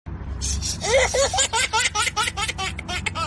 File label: baby laughter